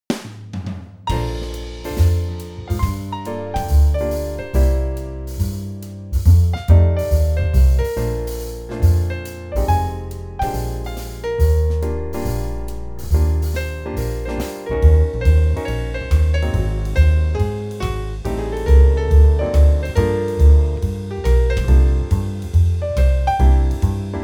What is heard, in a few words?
Jazz City; Jazzy